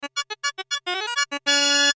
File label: Melody Chords, Melody Chords Silly; Toy Melodies; Toys; Blastwave